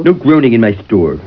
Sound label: groan